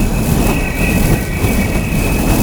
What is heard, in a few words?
foxfire loop; LoL; ahri